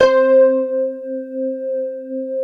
keys; DCS KEYS

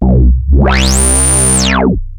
OSCAR C2, synthesizer; Hollywood Edge - Giorgio Moroder Rare Synthesizer Collection

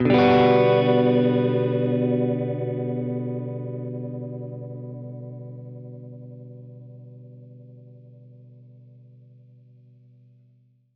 Gretsch Chord Bminb6, Guitar Chords; undercover samples

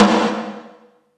Big Conga

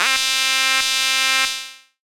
syn, Dance Synths, Wasps; ProSamples vol.37

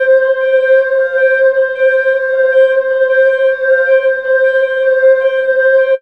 Pad; Dream Zone

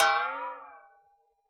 BOX FX; Univers Sons - Raricussions

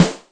Club OK, Yamaha DTXpress Acoustic Snare

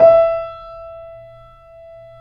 YC7 piano, pp; Roland L-CD701; pp YC7 St

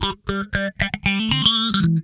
Funk Guitar; 90 s Sample CDs; Zero G

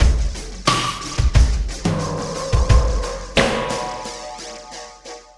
Sky Lab Beat, Processed Drumkits

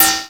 METAL; Best Service Dream Experience

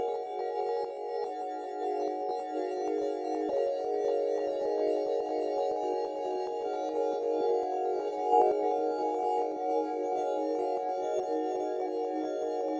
IDM Chord; pad

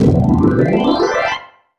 Slide, Organ, Loops

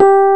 RH, Rhodes; Greytsounds; CLASS RHODES

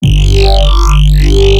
harmonic zipper, bass

